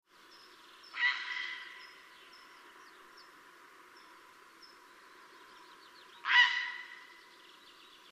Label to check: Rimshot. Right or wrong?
Wrong